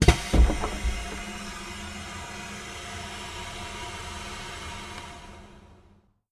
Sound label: open